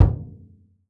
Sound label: LRG WOODE, ETHNIC SET; Voice Crystal - Steve Reid Definitive Percussion